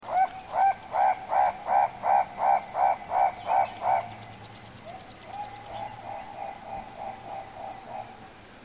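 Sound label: Guinea turaco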